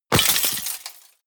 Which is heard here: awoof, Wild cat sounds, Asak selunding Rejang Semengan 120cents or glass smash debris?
glass smash debris